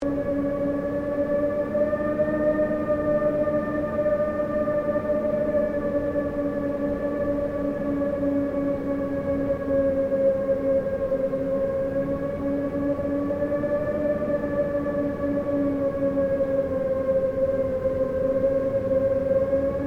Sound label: Wind Howling Extreme Loop, Strong howling wind; Weather